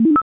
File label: menu; gearbox